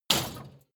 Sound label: metal impact scrap metal bike; hits explosionen; metall